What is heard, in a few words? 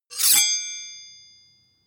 sword blade grind short ring